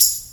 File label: Nice Hat A sharp, Royality free high-hat sound tuned to the A# note. Loudest frequency: 8555Hz